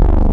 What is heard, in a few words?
D SAW BASS; Pro-Rec - Synthsonic; BASSES